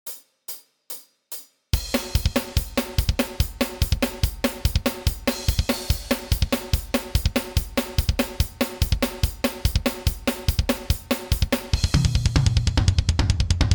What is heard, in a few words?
144bpm, bass